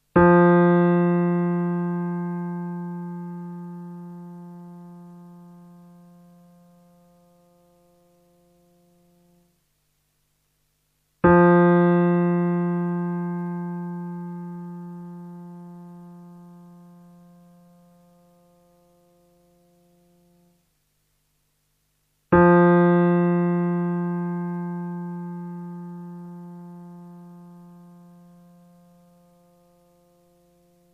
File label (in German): Stimmton Posaune f